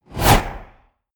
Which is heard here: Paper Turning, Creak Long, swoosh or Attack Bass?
swoosh